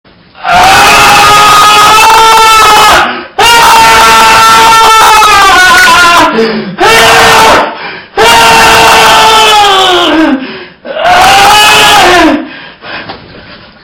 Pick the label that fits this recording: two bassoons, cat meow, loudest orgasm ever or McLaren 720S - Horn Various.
loudest orgasm ever